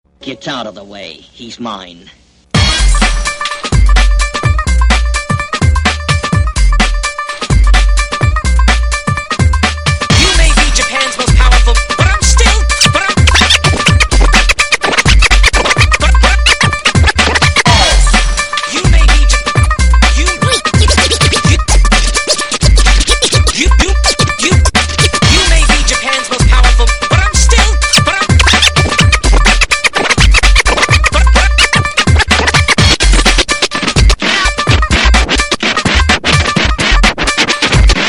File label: Live Scratch; UK Punjabi